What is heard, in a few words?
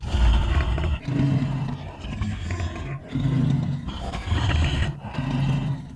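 deathclaw conscious loop; npc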